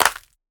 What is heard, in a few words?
clap b key; percussion single hits one shots